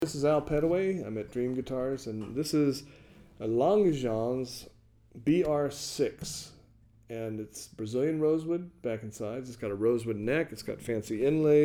Langejans BR6, steel-string guitars, flattop

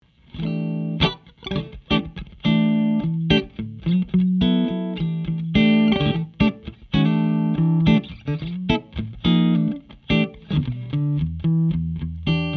Electric, solid body electric guitar; 1994; guitars; Klein

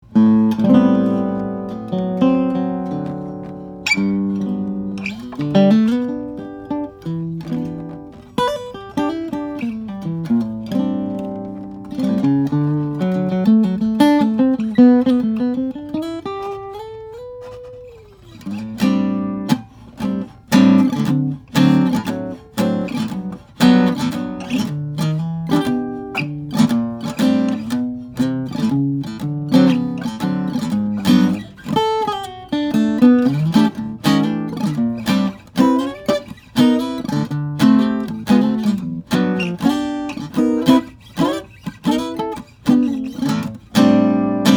guitars; Benedetto Sinfonietta; maple; european spruce; acoustic

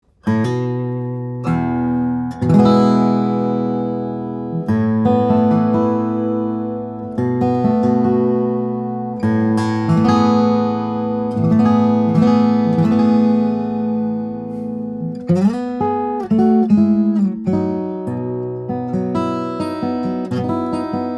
000 Cutaway, steel string guitars flattop; royal macassar ebony german spruce

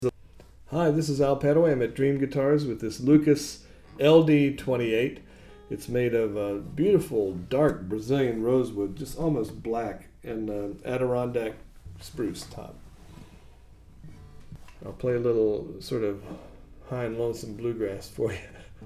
Lucas LD-28, steel-string guitars, flattop; dreadnought

